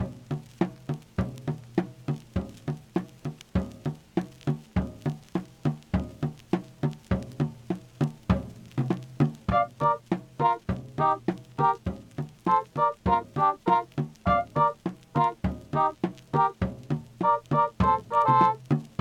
Menu Loop; Pix the Cat

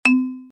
Click; Angry Gingerbread Run

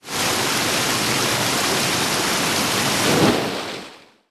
door; Shin Megami Tensei IV Apocalypse; 3DS

